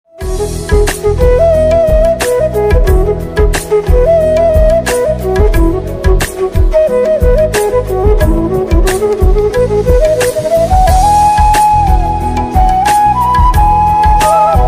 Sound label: radha krishna flute theme ringtone instrumental